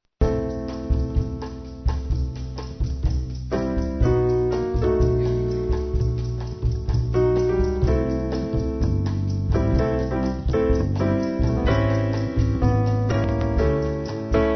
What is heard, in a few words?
meditation